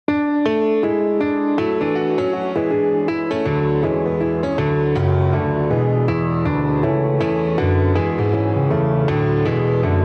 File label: Piano